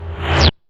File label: Break Snr 2 Rvs, drum machines; Roland SH-32; Drumset 04